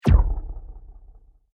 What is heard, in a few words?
Sweep Mechanical Fast; Created sweeps, swooshes and other movement effects; Sweepers; Sound Design